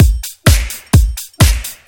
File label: Housebeats, 128BPM; Vengeance Samples; VEH2 Loops